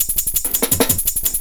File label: hat shuff 170, perc loops, drum&bass; EZRollers